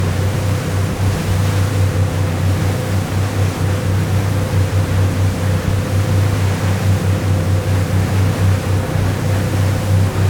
Speed Boat Outboard Motor Medium High Gear Waves Splash